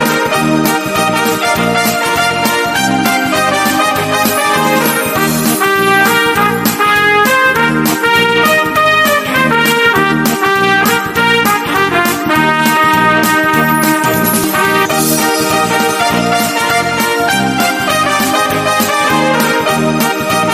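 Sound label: Happy Trumpet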